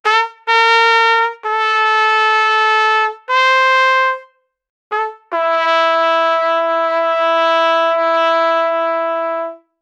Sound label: a one shot sample with, 8-bit